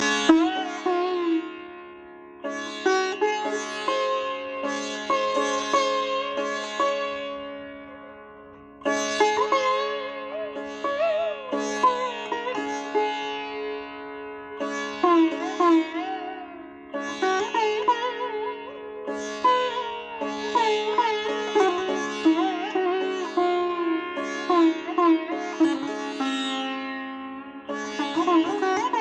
Sitar

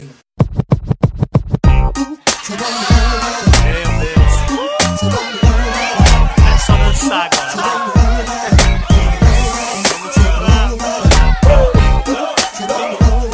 Black Gospel

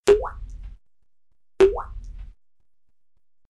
Water Drop

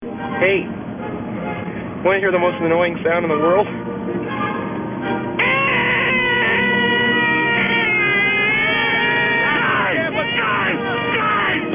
Shouting

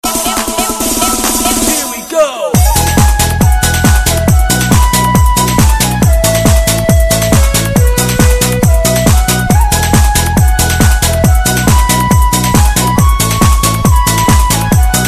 Soft Mix; New Titanic Soft Mix

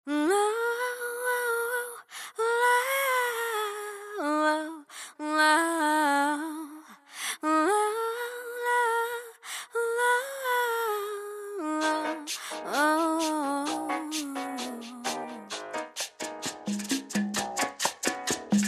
Female Humming

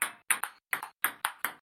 Ping- Pong Rhythm

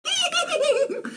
laughing; SeokJin

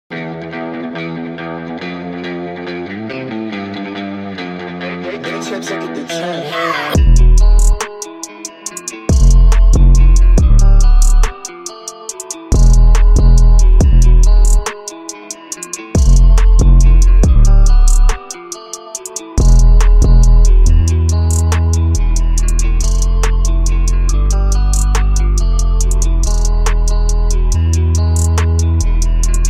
Instrumental

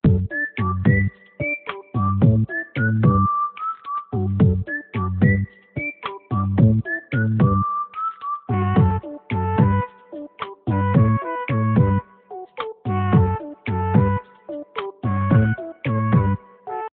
Weird cat noice

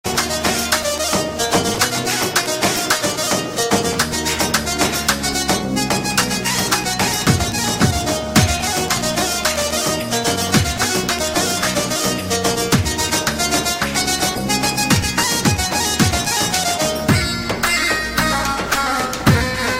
Arabic Music

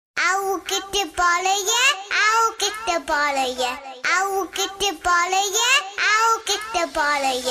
Cute Punjabi Tone

